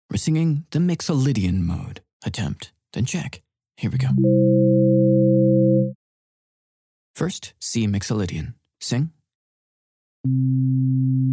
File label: Vocal System Mixolydian Exercise C F